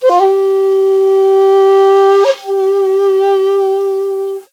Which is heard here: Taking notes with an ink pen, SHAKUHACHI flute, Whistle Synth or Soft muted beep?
SHAKUHACHI flute